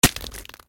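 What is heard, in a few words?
shot straight into live target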